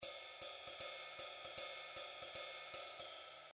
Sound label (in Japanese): 4ビート, ライドシンバルで4ビートを表現した例です。; ジャズ; シンバルで4分×4を表現しますが、3連ノリでツーゥタッタ ツーゥタッタなのがポイントです。; 4ビートは、主にジャズで使われるリズムです。